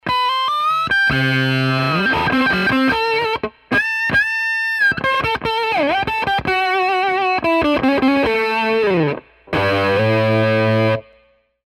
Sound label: strat; Strat- with Octave